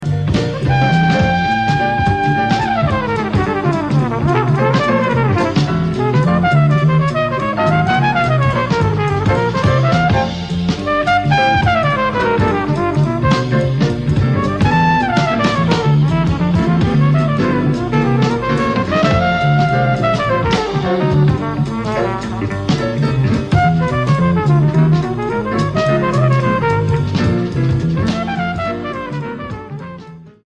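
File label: trumpet solo; 1983 East Berlin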